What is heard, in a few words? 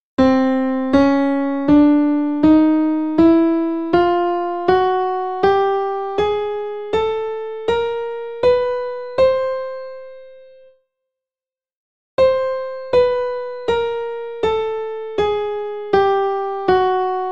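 examples of the sound, chromatic scale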